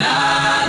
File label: Key chant; Luxembourg Zwee